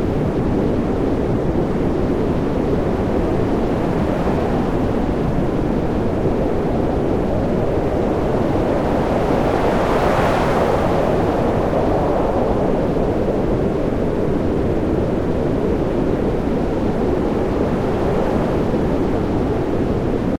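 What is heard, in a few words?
music wind; Undertale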